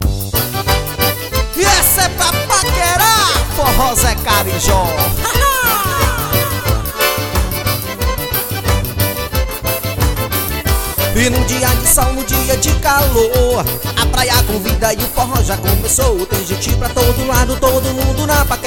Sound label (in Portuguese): forro da paquera